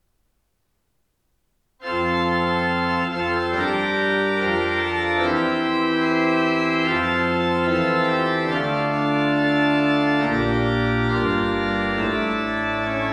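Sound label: Fanfare, Sør-Fron kirke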